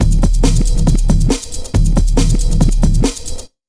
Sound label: Big Beat Drumloops, 138 BT